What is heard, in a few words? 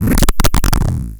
Vactrol Trouble; Cwejman S1; DevSnd Cwejman S1 - JK